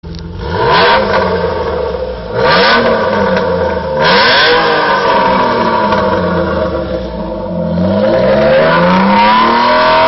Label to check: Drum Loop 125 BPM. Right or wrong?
Wrong